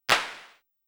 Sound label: CLP, Sonic-Potions LXR